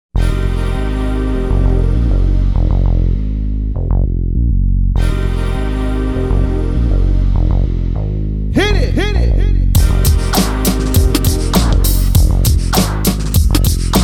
Hit the Groove; Soundzabound Volume 4 Disc 2

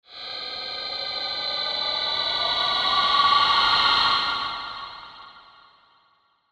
Eerie Airy

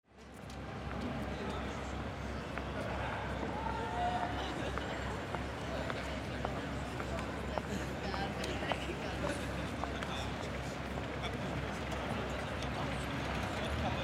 pedestrians

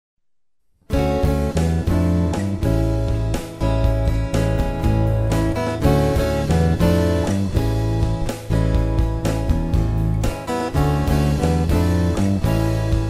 Ambient

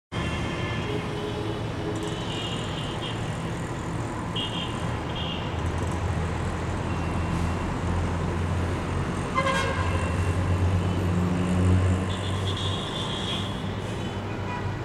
Traffic; Soundzabound Volume 3

